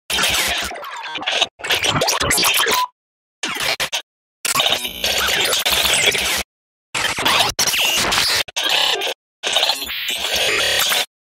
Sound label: glitch sound effect